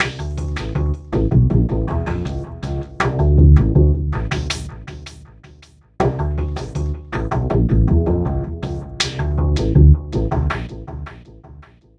Remixed Acoustic Bass; Sonic Foundry; Leo Cavallo Bass Taster

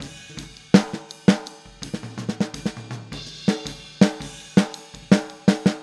Boogaloo Fill Snare, 82 BPM; Sonic Foundry; Drums from the Big Room The Mixes

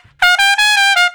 Trumpet Shuffle (Bb); Crimson Blue and Fabulous Horncraft 4 RnB; Horncraft for R&B

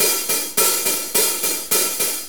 4-4 HiHat Loop; 4-4 Drum Loops; HiHat Ride Loops; Sonic Foundry; Drum Tools

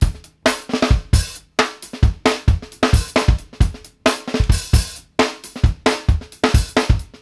FUNK, KD JBEAT 132; Sampleheads; New York City Drumworks VOL-1

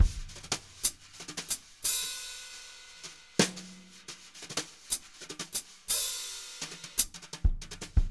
jazz brush loops

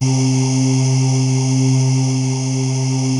ITOPIC C2, SYNTHVOICES; Music Store - Claudius Diemeter Schwerlos

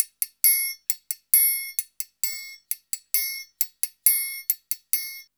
089 FREES, Modern Percussion Loops

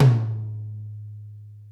tom; Sonic Reality; Interactive Drum Kits; 90s sample cds

